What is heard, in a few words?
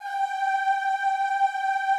G4-L, Classical Choir; Best Service; Extended Classical Choir